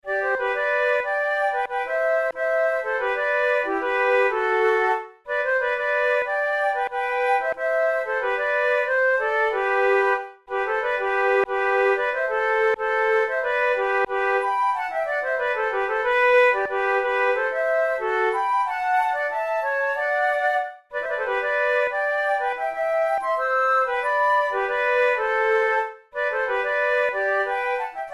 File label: duet, flute; Oh merry row the bonnie bark